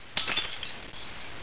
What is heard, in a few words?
debris glass; valve